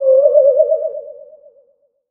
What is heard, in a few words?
ghost appearing